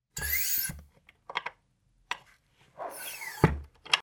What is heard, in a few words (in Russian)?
zvuk kresla